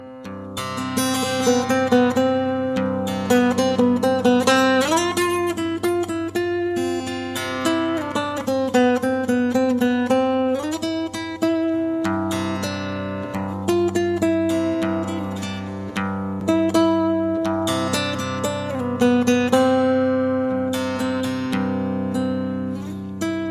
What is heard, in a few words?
Subtle Strings